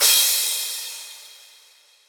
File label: drum hit finish